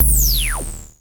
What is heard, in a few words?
Klone Dual Percussion Synthesiser